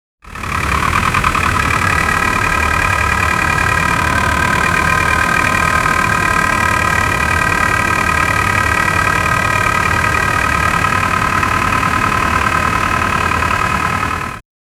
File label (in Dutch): motorgeluid van de 180cc; motorcycles